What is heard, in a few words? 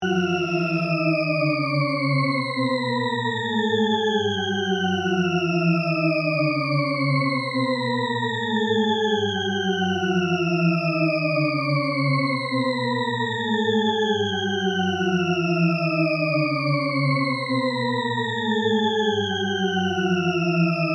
Shepard Tone Down